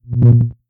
Woop Transition

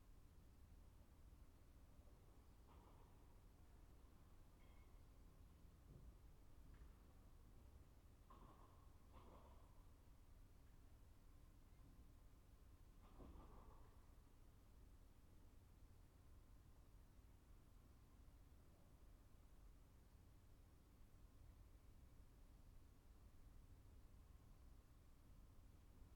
Room Tone 44x22x6m Gym

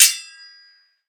sword

